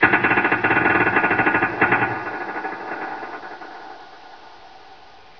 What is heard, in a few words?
creak; dm borehole